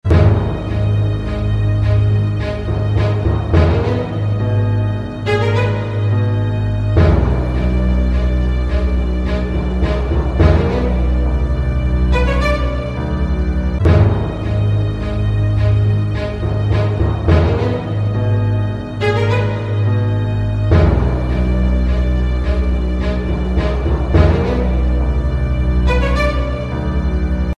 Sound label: background germany; Heroes Saga